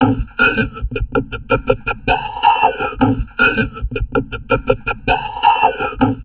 TALK, Drum Loops Crazy Processed

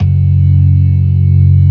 ORGAN, NO PERC

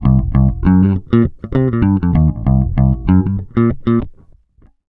LIGHT C, Bass Grooves; USB Soundscan vol.30; AKAI

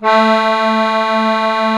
HOHNER, akkordeon

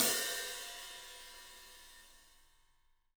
ZILDJIAN HT; 3D Sound; 3D Drums

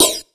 126 DRM, Disco House

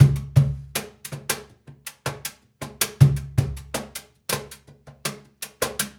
Cajun, Percussion, Drums; Essential Percussion

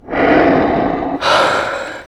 VOX VOX, Electro Vox

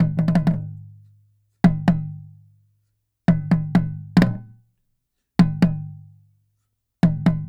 Small Taiko, Asia; Sonic Foundry (Sony Creative Software); Continental Drift Aftershocks

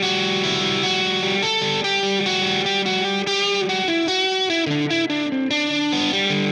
Silos Lead Guitar Riff, Indie Rock

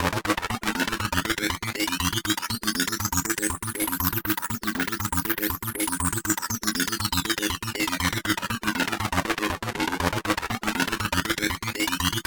Synth Distorted; Electrocution

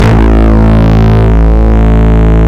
Synth Bass, LA Syn Bass

